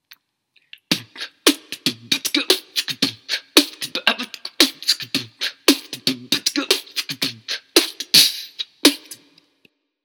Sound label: beat